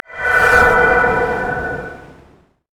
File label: summoner stifle; LoL